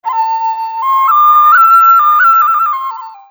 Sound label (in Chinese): 笛子生